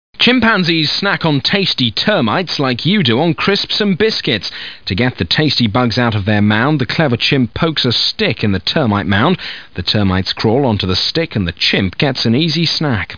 jig

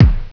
Kick

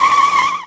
skid; Superbike 2001

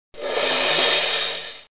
morph; How Many Bugs in a Box

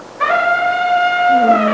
ELEPHANT; jungle animals

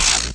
collide; balloonrain

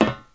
step; kingpin; player; metal heavy

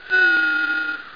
snore

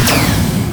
shields; ast miner

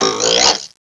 Boink; Princess and the Pea; SoftKey

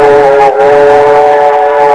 Train Whistle; Knockoff

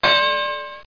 clang

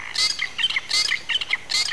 drongo; onsafari

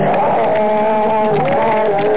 transformation; horror

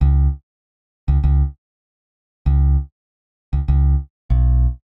Bass; FUNKACID3

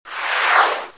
Zoom; Stanley's Sticker Stories (1997)(Edmark)

